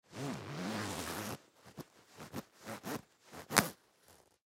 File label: Clothes Jacket Bubble Vest Vinyl Zip Up; Jacket Bubble Vest Vinyl Zip Up Stuck Pull Hard; Dressing; Foley